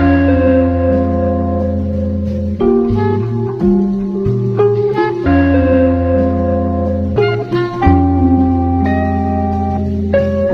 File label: horns, jazz loops